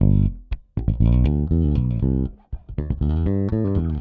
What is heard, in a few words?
Pop, Bass